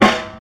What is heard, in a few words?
dope snare drum sound g sharp key